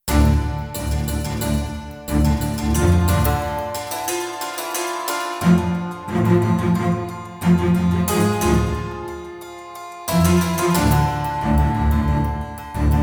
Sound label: Combat; The Witcher 3 Wild Hunt; Novigrad